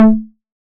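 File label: 808 low conga, Tape Sat; Tape808; Goldbaby Tape808 & Tape909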